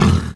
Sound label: uruk hai hit; spawners mobs